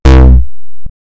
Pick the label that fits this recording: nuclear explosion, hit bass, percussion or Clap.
hit bass